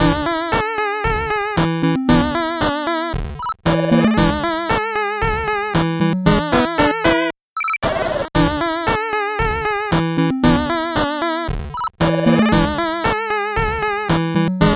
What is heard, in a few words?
8bit bgm fast